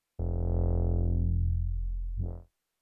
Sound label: aftertaste bass